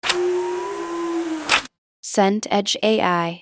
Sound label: tape rewind